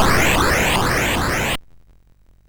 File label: sid MOS6581R2; hvsc